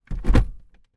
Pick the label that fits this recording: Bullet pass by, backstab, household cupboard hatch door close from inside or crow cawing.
household cupboard hatch door close from inside